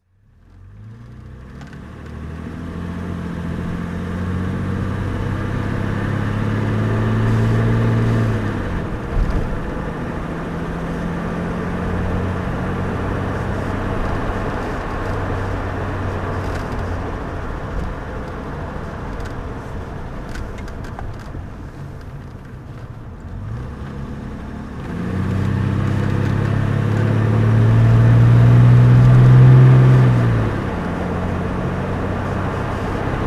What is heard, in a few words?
jaguar S-type34 driving; Jaguar S-type 3.4 Litre, 1965; Engine sound driving; V3D/ ClassicarImages; Marc Vorgers